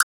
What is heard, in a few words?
click ok